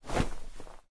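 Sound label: Movement Cloth; smite; god bakasura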